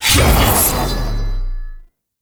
Backstab Cast; Warframe; PaxDuviricus

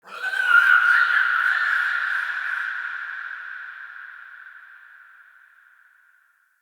Day Screechy Creature

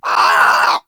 Bird Of Prey Vocal Scared

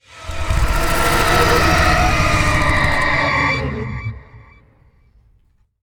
Vocal Idle; Warframe; Zariman; Void Angel

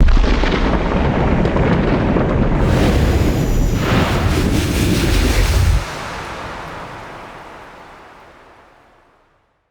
Potion Smash Clear Sky

